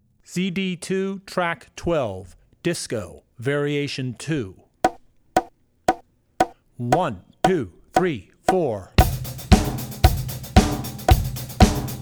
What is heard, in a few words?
Disco; Drummer's Bible Tracks; Disco (Variation 2)